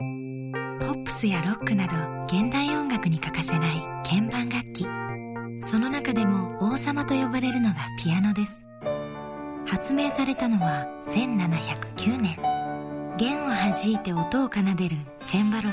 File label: piano